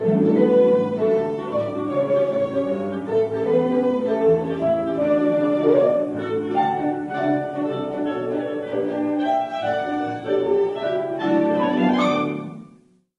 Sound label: CLASSICAL MUSIC